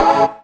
chord one shot bit A, Classic Chicago House; Sample Magic; Chord Shots

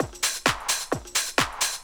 130 addon, DRUMLOOPS, HIHAT; Ueberschall; Techno Trance Essentials; LOOP ADDON4

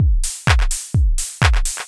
808 Beat 127

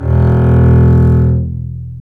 STR ARC BS, Cb Bowed, Cb1 mf vb; String Master Series

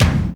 BD, DR BD; Best Service Gigapack 2

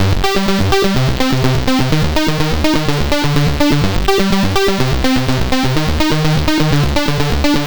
arp terror Cm 125, synth loop; Zero-G; Soundclash; Nu Dance Electro

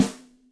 ASOBI, Electric Snare, Yamaha DTXpress

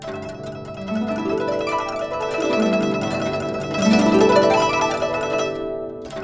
GUCHIN 90 D; INIS - Opium

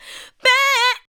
ADLIBS 90; Ueberschall; Jam Box

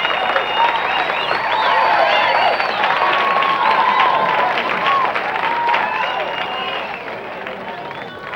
applause; Sonic Foundry (Sony Creative Software); Ugly Remnants VOL-2; UR 2 FX (Long)

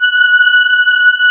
CMI ORGAN

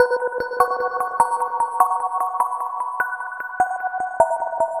Shimmer Mallet

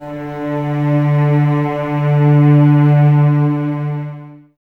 cellos D 3, String Ensembles, Expressivo; Miroslav Vitous

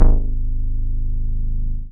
BAS MINI, Mini-Moog

